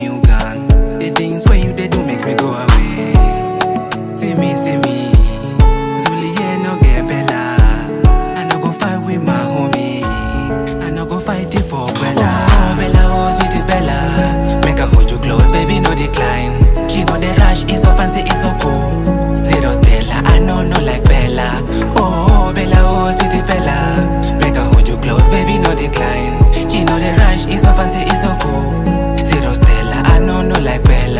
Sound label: Melodic Instrumental